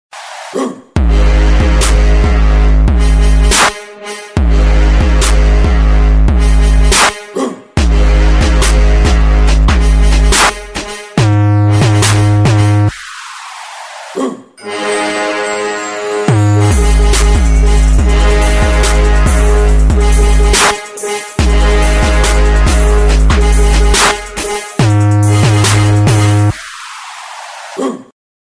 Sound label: Hard Core Ringtone